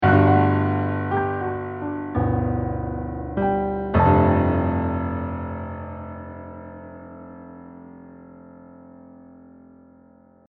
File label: Level 2 Victory; chirpy reverie online gamerip 2021